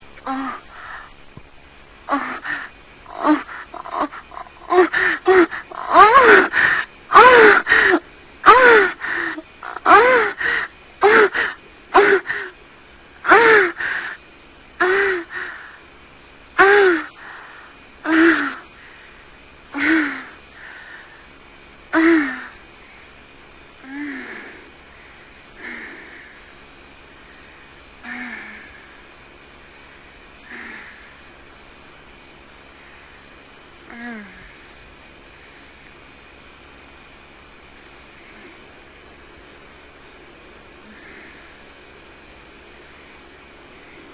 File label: orgasm